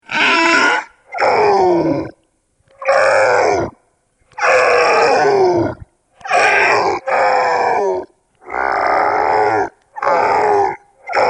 Nasty sounding croaking squawking Daemon or equally evil creature; Monsters; Horror